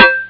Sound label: Heifer